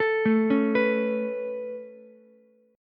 A Major Add 9th A Ukulele C6, chord; Aadd9 arpeggiated; JGuitar

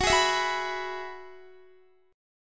Gb 7th Suspended 2nd Suspended 4th, Guitar; Gb7sus2sus4 strummed

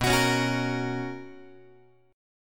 B Major 7th Suspended 2nd Guitar, chord; BM7sus2; strummed; JGuitar

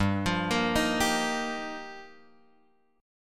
G Minor 7th Sharp 5th, Guitar, Drop D

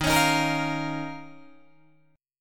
Eb 7th Suspended 2nd Eb down Guitar DADGAD; strummed

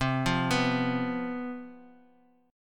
C Major 7th Suspended 4th, C, down, chord; arpeggiated

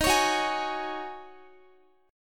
Eb Minor 11th Eb down Guitar 4F,4A,5C,5G,5C,6E; Ebm11 strummed; JGuitar

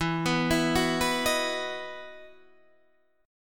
E Major 7th Suspended 2nd, Guitar, Standard; EM7sus2 arpeggiated

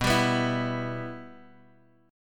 B Suspended 4th Sharp 5th, chord; strummed; 7,10,9,9,x,x

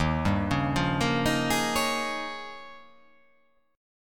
Eb 11th Eb, down; arpeggiated; JGuitar

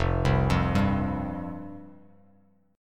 F Suspended 2nd F; Fsus2 arpeggiated; JGuitar

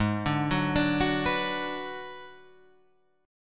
Ab Minor 7th Flat 5th

